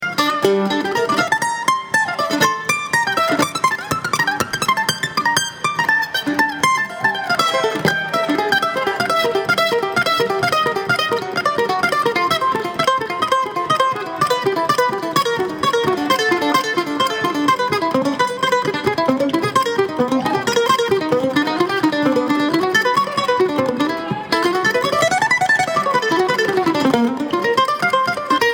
Kentucky Mandolin

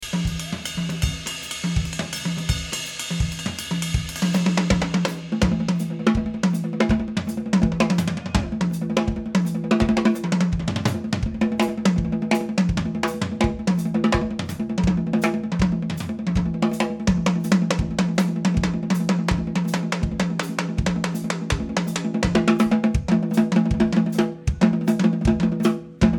Drums

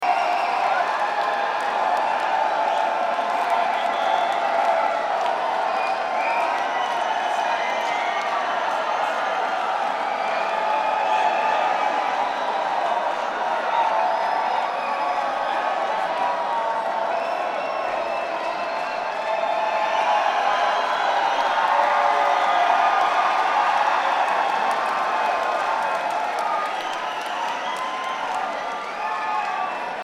Crowd

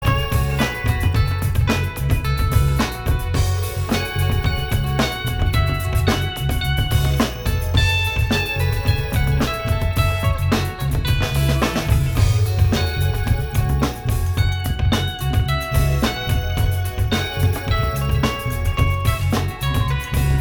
Improv Jam

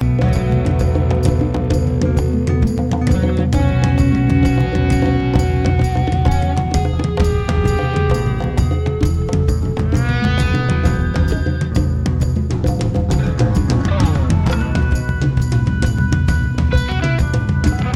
Drums

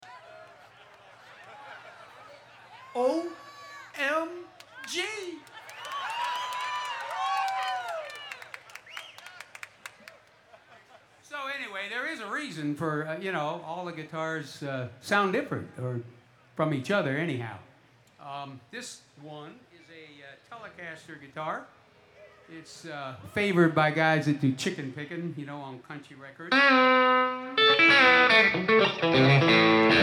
Guitar Moment

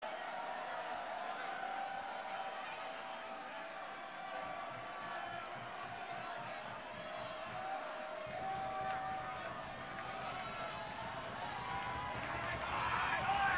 Applause

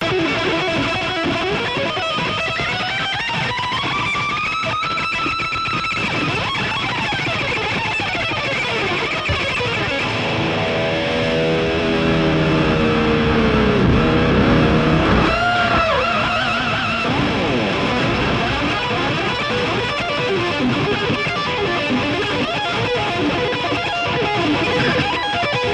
Guitar Solo

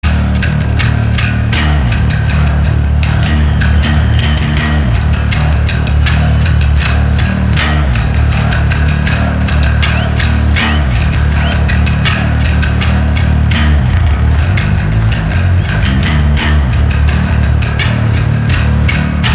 Bass Solo

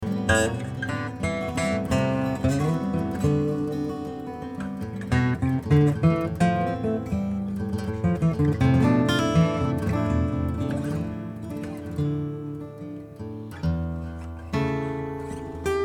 Guitar Space

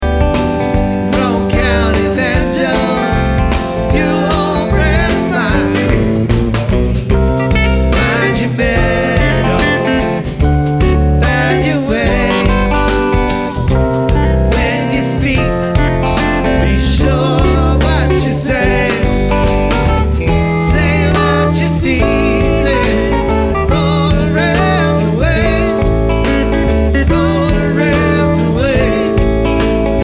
Banjo

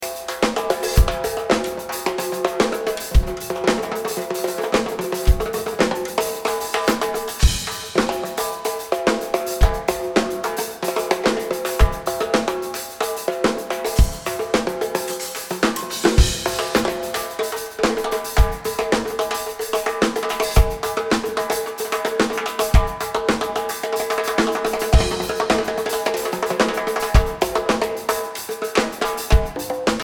Drums

